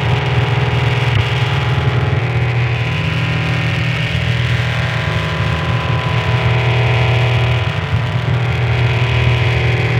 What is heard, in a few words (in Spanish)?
CIS-12 aka AT3004D. PSK Multicanal. 12 canales a 120 Baudios. BW = 2K60E. Línea piloto a 3.300 Hz.; dsb submode idle